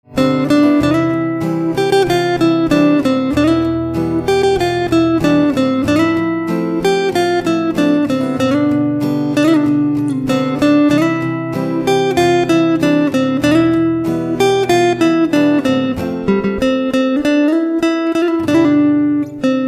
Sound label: Guitar